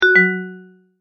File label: multimedia tone end; McKinney Sound